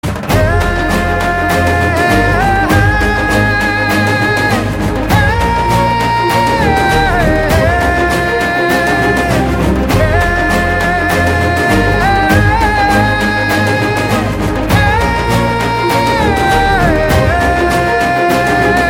humming tone

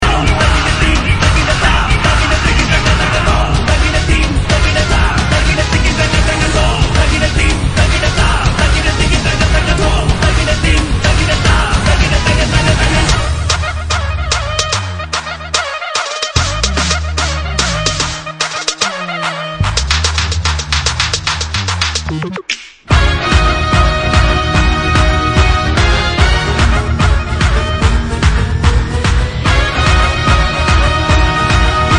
Drum Beats Ringtone

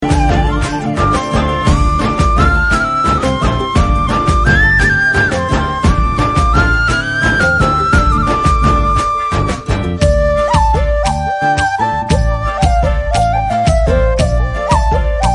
Flute Ringtone

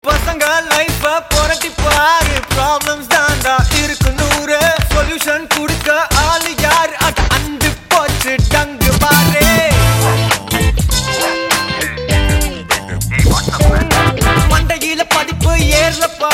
Song Tamil Starting Ringtone

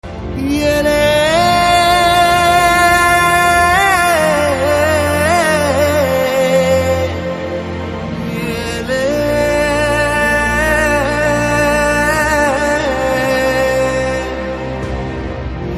BGM Instrumental Ringtone

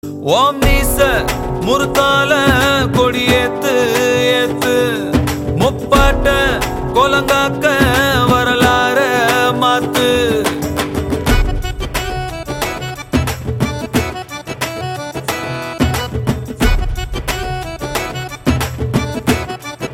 Love Ringtone

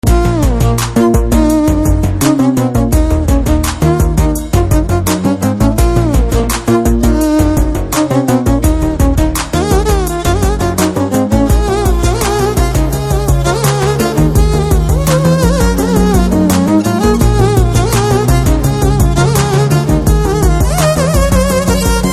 instrumental tone

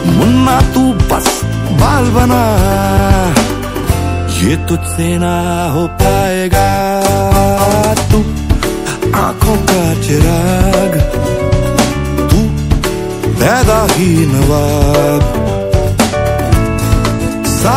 Music Tone

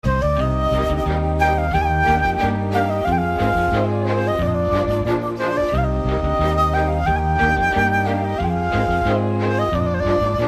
Instrumental BG Tone

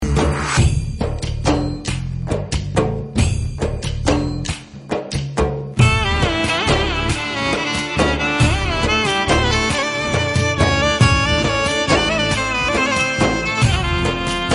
instrumental tone

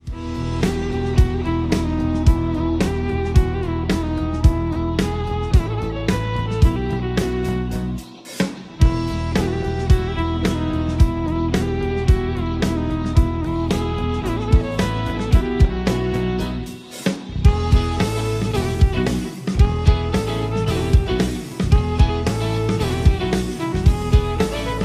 Violin BGM

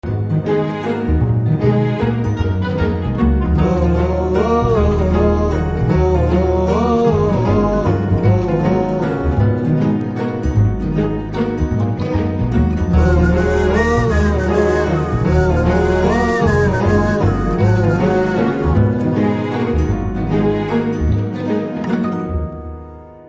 Theme BGM

